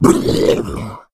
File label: boomer death